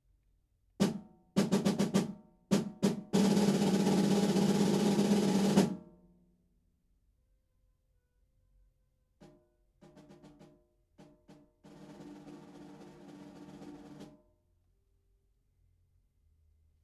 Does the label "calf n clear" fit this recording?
Yes